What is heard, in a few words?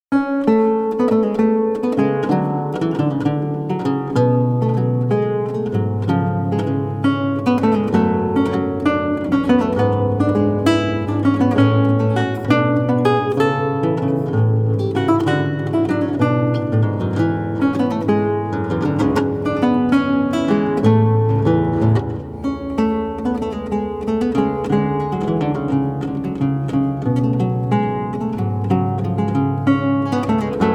Lute Suite in G Minor, Gigue; Bach, J S; BWV 995; VI. Gigue